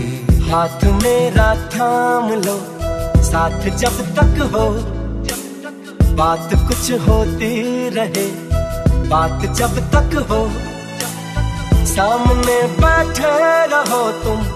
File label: Hindi Ringtone